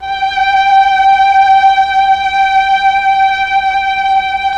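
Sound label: strings Mellotron violin, slow violins; Roland LCDP09 Keys of the 60s and 70s 1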